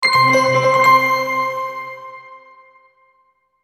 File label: Logo Bells Reverb; Sonic Logo; Blastwave